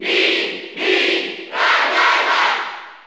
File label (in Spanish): Grito del público (Español NTSC); Mii; SSBU